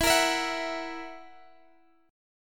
E Suspended 2nd Flat 5th E, Guitar, Half Step Down